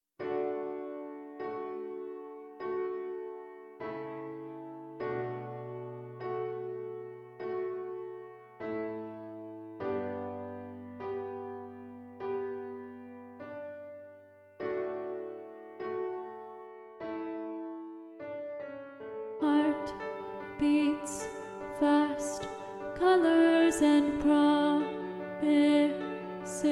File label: Soprano 2